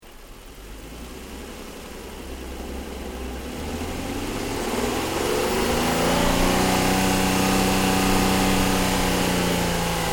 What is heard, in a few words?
CAR NISSAN 350Z ENGINE BAY ACCELERATION GENERAL, manual sportscar; Cars (Sound Effects:Vehicles)